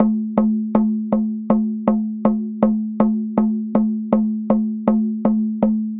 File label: Personal Prayer Drum, Native American; Sonic Foundry (Sony Creative Software); Continental Drift Aftershocks; Shakers